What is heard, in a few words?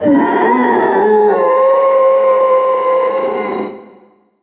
rise as mummy; DAoC; Toa; Gamestar